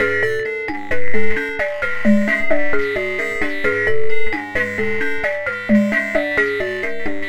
Sine Wave, Processed Percussion

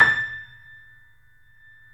Small Pianos, 3-D Audio Collection

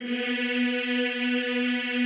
EEH, Classical Choir

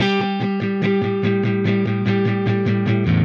Silos Dirty Strum Guitar, Indie Rock

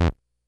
Bleep B, Drum Machines, Korg DS-10

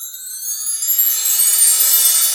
PERC, Dance Mega Drums; Best Service; PERC-MIX

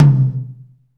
TOM, Roland; Northstar - Drumscapes Roland; Med. Tekno

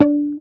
Bass, MUTES

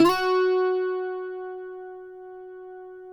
cuatro F#; Spectrasonics; Hans Zimmer Guitars VOL-2

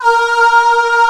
VOCODER; East West; Global Sounds

